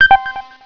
Radar Pulse; Focus AudioClock v3.1.1

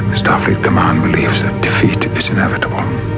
DEFEAT

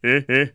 "heh heh", phrases; Wired for Sound Pro CD; Aristosoft, Inc.; homer

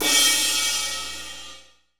crash, Drums

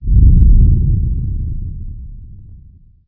rumble

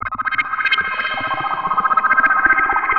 synth, Drum+Bass, 160, C; Keys

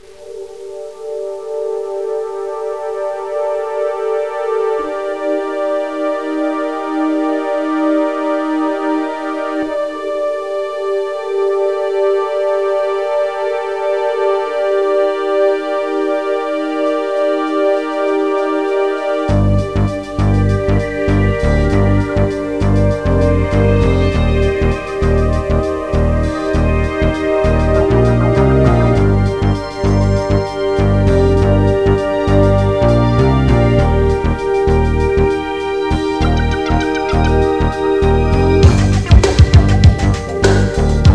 dark mel; MusicScapes Professional; Wayzata Technology

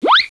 Slide; Princess and the Pea; SoftKey

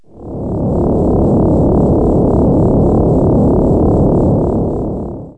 Spaceship Idle; Spaceships

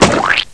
bad noise

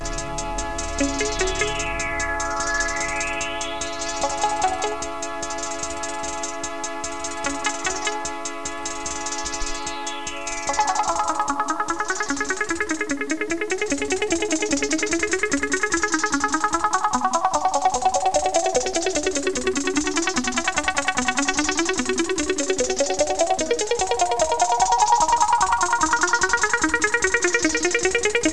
THROB; Tony Quinn's Virtual Worlds; Wayzata Technology

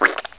Squish

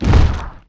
bodyslam

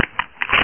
clip out; duke3d

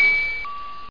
ding; Wired for Sound Pro CD; Aristosoft, Inc.; funnysnd

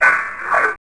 Noise; Revista CD Expert 50

